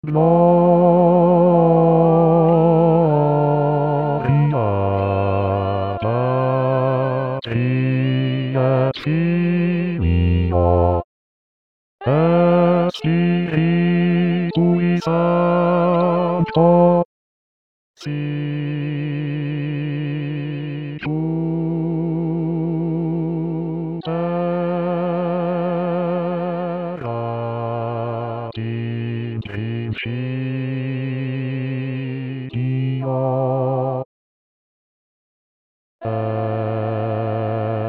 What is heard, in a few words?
Laudate Dominum solo coro bassi, voci guida; Mozart; corali in vaticano 2024